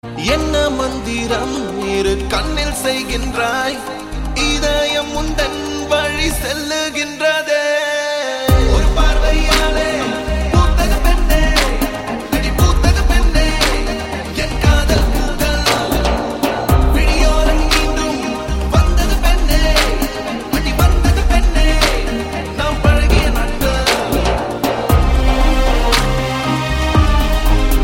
sms doo love, ringtones

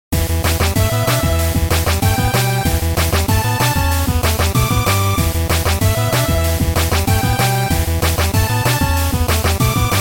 8 bit; Noragami

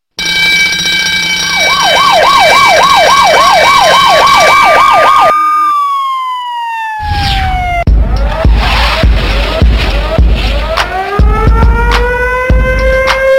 alarm ringtone

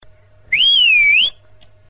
Wiko Whistle

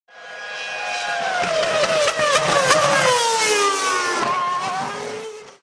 spa downshift

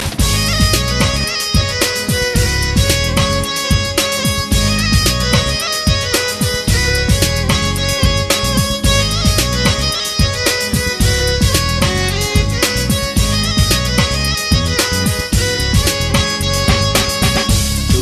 Instrumental

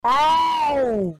Ouuuuu

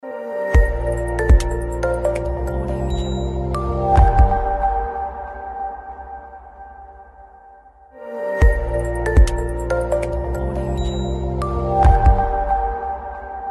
BASS

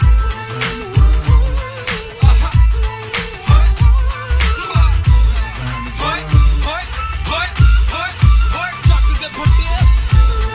punjabi dhol

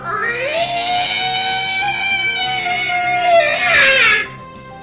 Weird bird squawk

